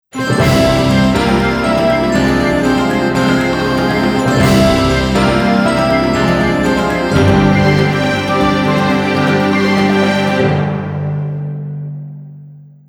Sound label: Hunters Fanfare; Monster Hunter Frontier